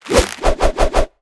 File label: Mandragora Skill